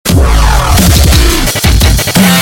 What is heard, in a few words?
dub step alert, ringtones